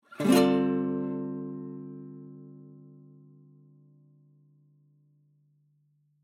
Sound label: guitar rasguado